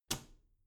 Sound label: bathroom cabinet door close soft